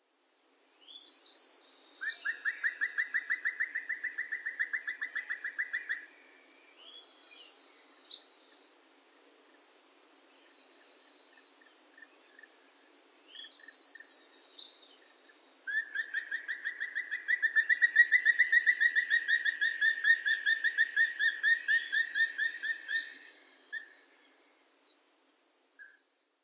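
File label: Black Woodpecker; kumagera; koe; uryu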